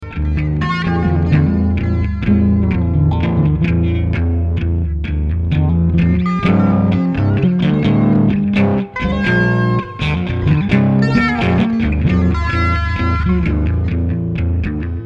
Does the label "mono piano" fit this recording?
No